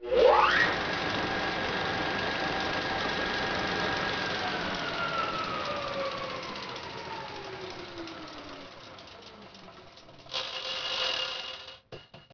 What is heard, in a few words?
motor windup and stop